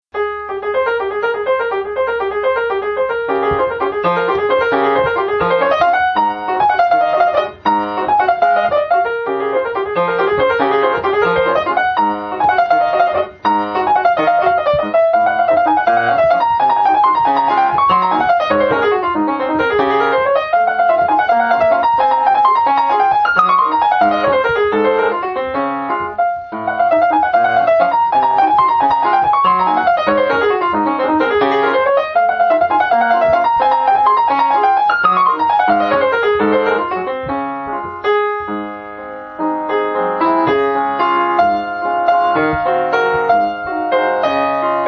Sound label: waltz, piano